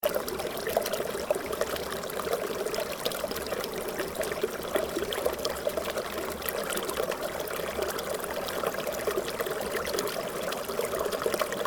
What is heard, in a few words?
water creek sound